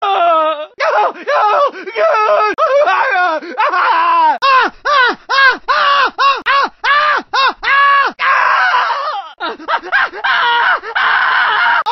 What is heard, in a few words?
pedestrian voices coughing pain male; gta san andreas